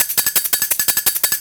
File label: Bell + Shake 170, Drum&Bass Perc Loops; EZRollers